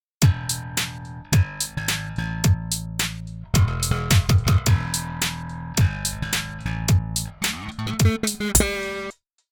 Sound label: Mids Overdrive On